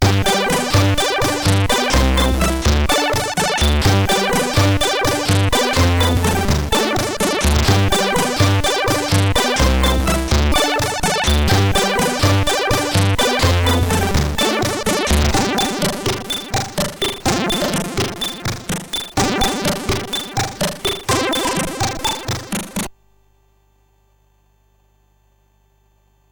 sid, CSG8580R5

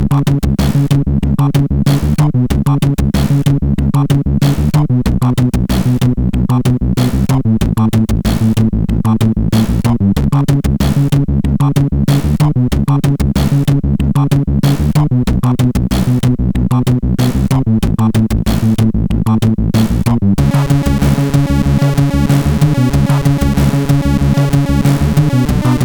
Trance sid CSG8580R5; Astovel; hvsc

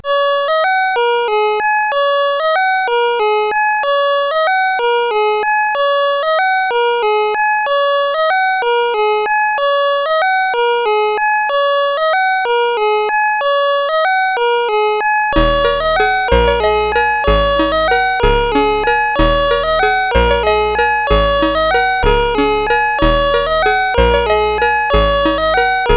Soundwave Tubular Bells

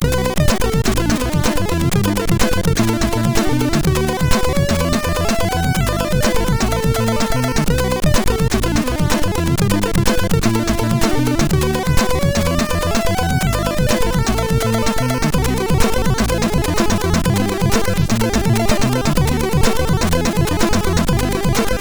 Weird, MOS6581R4

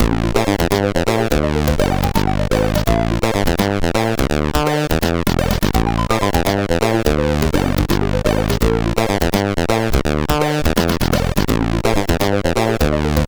Music sid CSG8580R5

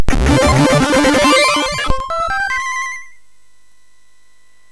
sfx, MOS 6581; hvsc

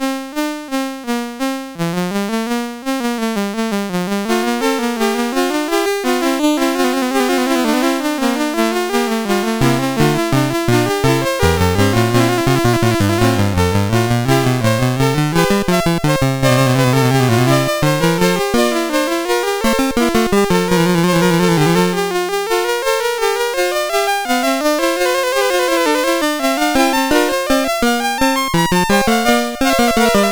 Fugue No 1, CSG8580R5

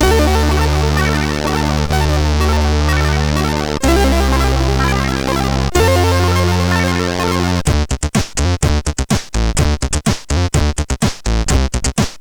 Beat Bass, CSG8580R5; sid

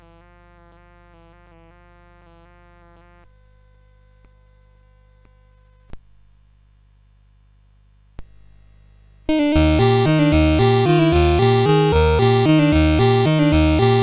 Waltz, CSG8580R5; Joseph A Grau

